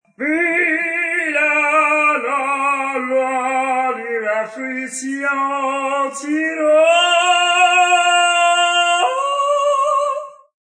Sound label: Male, Opera singing in bathroom ambience, comical, vocalization, song, (human voice)